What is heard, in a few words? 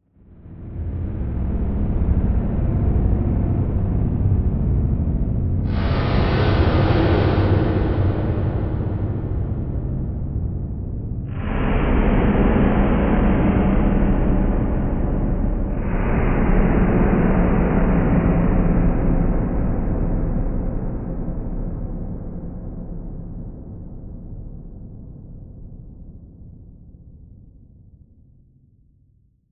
Electronic Whoosh Reverse Feedback Digital